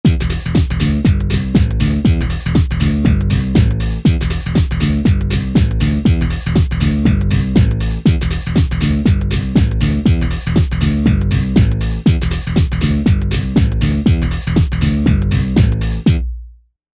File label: Techno